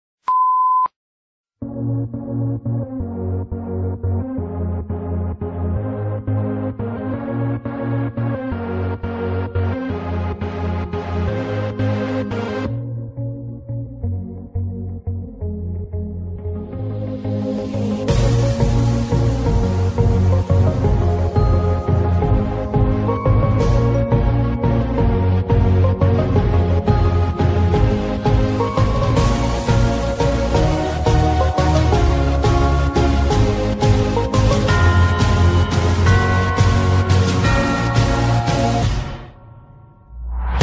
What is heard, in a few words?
gymnastic floor music burn